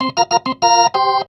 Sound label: B3 LICK A#, PLAYED